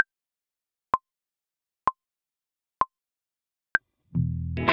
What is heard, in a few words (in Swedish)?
metronom